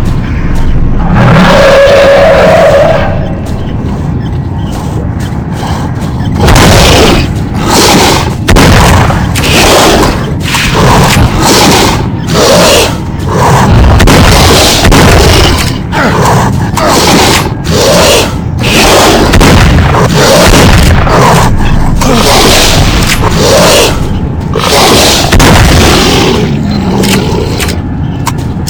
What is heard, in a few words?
bloodsucker; snork